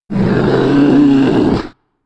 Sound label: Grizzly Vocal; Animals; XBOX; BassProShopsTrophyHunter2007